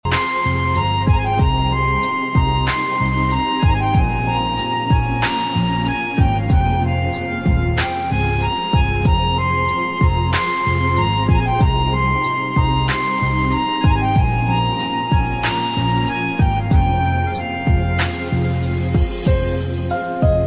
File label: romantic ringtone; bollywood ringtones